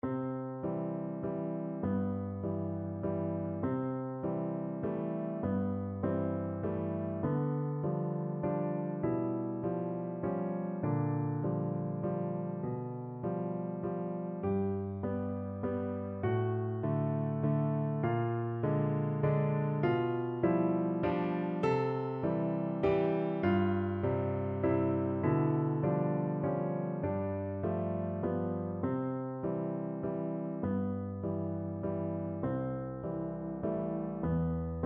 tchaikovsky waltz, piano duet

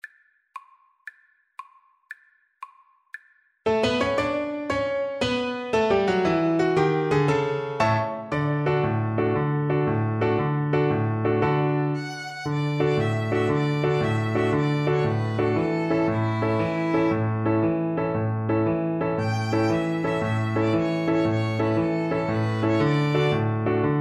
luna mezzo mare, Violin-Cello Duet

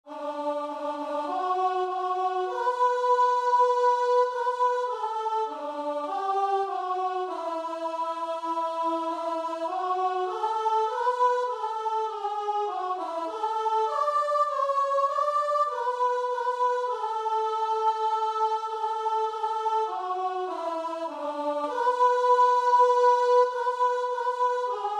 crown him, guitar and vocal

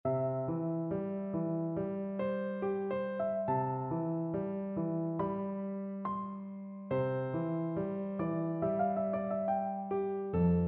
meditation, piano; massenet

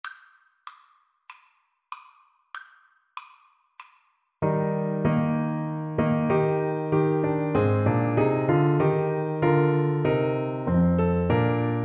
Navy Hymn, Clarinet

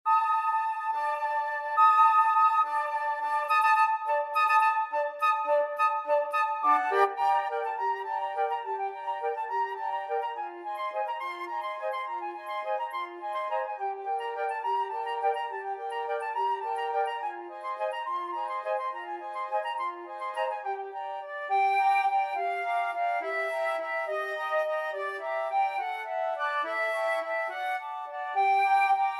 flute choir

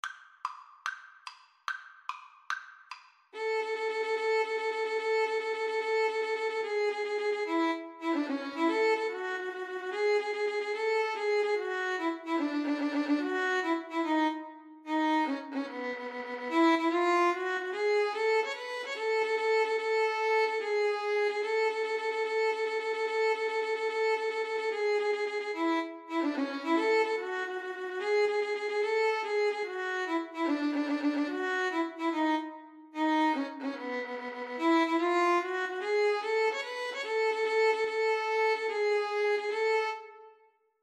VLN duet, Violin Duet

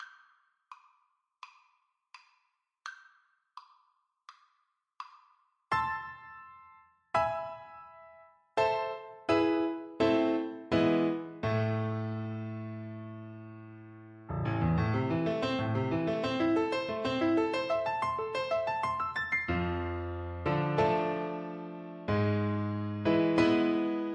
piano violin; grieg

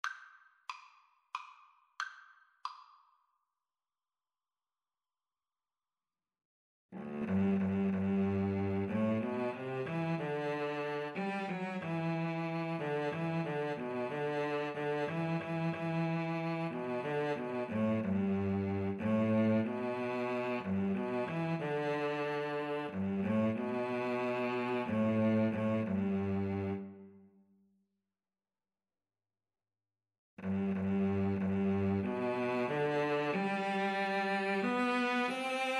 shenandoah, Cello Duet

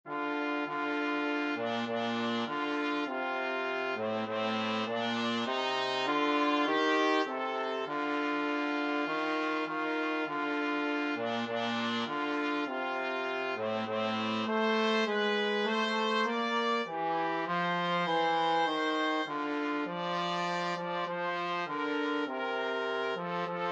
america the beautiful, trumpet trombone duet

